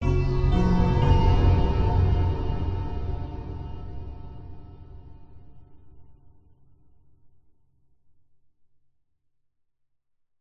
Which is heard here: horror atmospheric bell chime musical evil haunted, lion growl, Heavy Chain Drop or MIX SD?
horror atmospheric bell chime musical evil haunted